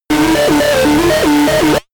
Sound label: game arcade race tension